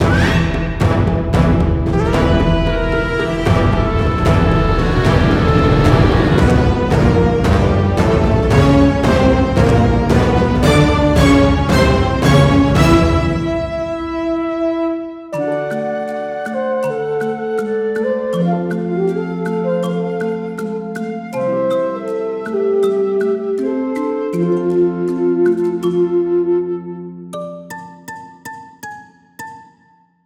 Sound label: Victory; Kaze and the Wild Masks; Victory I - Mona Knockout